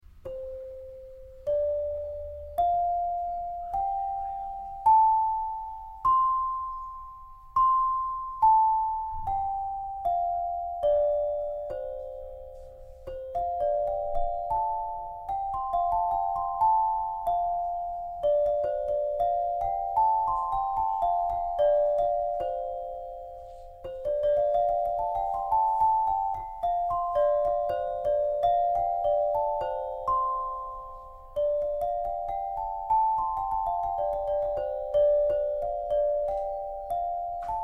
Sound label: metallophone resonator